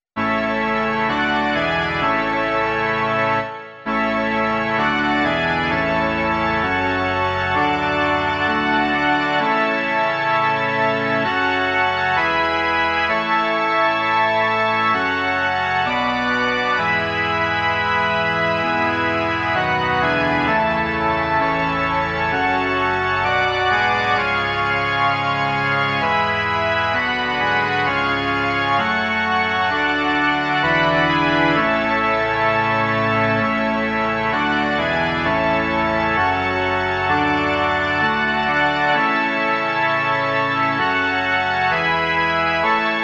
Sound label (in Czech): varhany